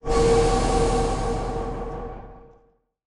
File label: plat corner